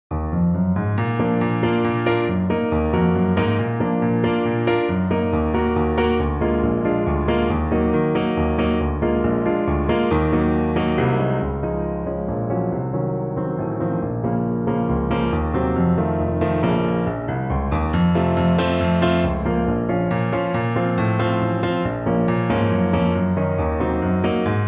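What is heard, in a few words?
danza danza alto saxophone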